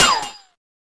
hit; Heaven Studio Plus; built To Scale DS

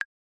tink; HeavenStudioPlus; lockstep

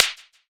Baton Down; HeavenStudioPlus; gleeClub

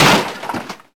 punch kick hit; HeavenStudioPlus; karateman

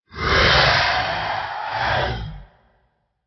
death, phantom; mob; biomesoplenty

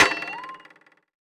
long jump; player; HeavenStudioPlus; see Saw

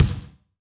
basketball Bounce; HeavenStudioPlus; doubleDate

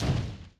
miss; Heaven Studio Plus; airboarder

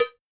Tonk; HeavenStudioPlus; rhythmRally

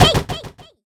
throw; Heaven Studio Plus; pajama Party

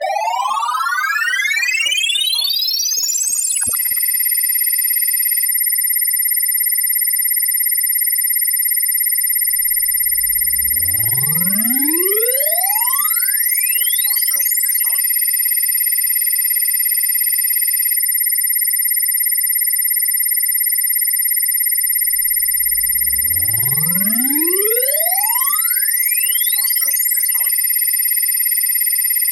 result gauge add; Heaven Studio Plus; rankings

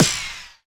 hit Far; Heaven Studio Plus; air Rally; hit Baxter Far2

